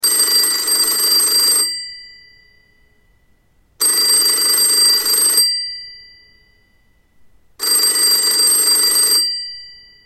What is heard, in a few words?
vintage phone ringtone